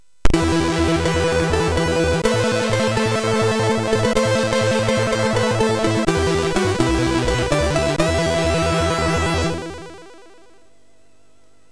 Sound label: Jingle, MOS6581R3